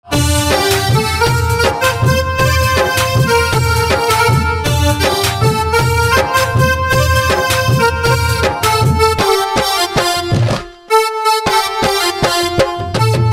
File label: Accordion Instrumental Ringtone